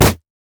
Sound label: red mech melee basic attack; minions; LoL